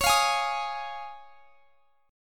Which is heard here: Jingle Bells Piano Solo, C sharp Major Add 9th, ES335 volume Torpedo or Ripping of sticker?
C sharp Major Add 9th